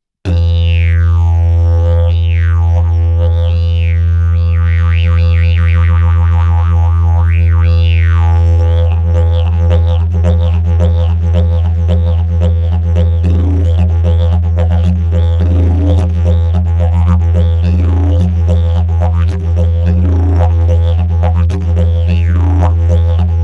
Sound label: didgeridoos